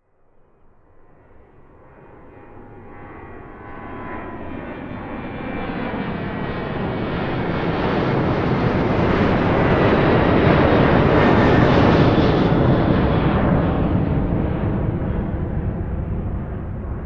transportation aircraft commercial jet pass low external; sound effects one